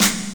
Short Snare Drum G Key, steel snare drum tuned to the G note. Loudest frequency: 4756; snare drum samples